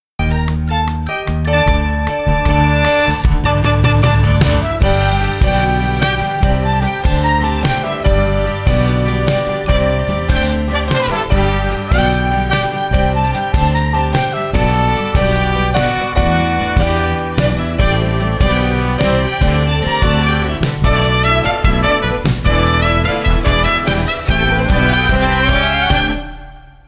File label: Accompaniment